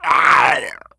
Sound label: die zombie; cstrike